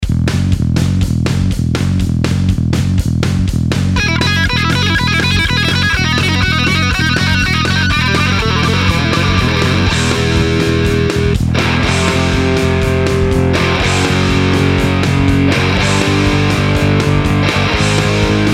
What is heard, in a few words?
guitar